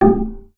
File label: BASS FINGER; Xsample VOL-5